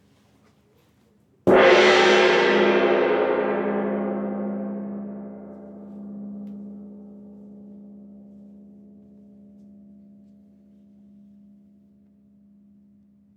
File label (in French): petit 1coup milieu droite, GONG VIBRATION